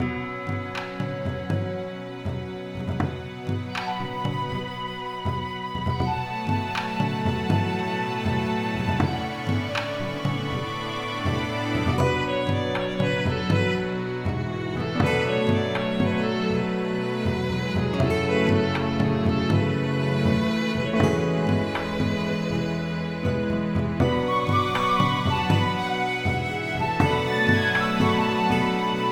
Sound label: Sailing